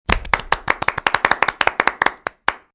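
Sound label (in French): applaudissements; MOBICLIC 56; MOBICLUB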